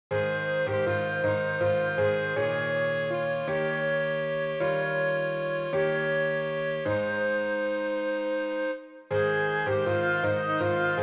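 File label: flute clarinet piano; Night-Song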